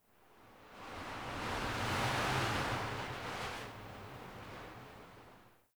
Under City Wind Airy Gust

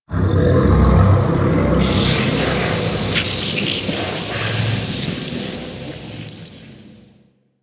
Disturbance, Ambience; Warframe; Entrati

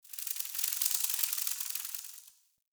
Cave Sprout Insect Close; Midrath Island

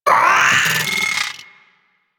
Vocal Attack; Warframe; Amalgam Moa

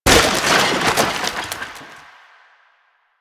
Wood Table Impact; Warframe; NineteenNinetyNine

